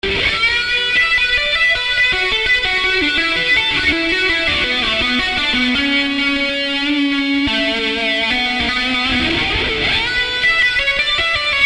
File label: Extra gain space